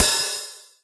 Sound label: Boss DR-202 hat